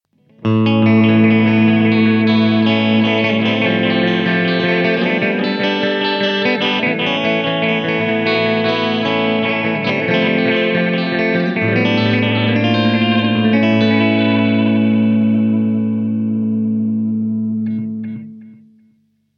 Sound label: Delayette Flutter